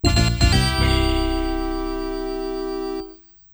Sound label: High Five; Knuckles' Chaotix; The 16bit Audiophile Project; Genesis